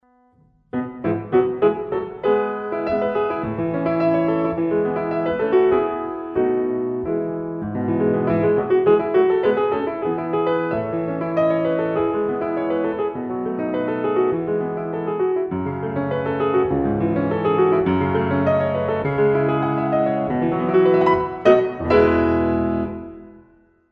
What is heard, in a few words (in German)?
piano solo volk, piano volkslieder; Der Tochter Wunsch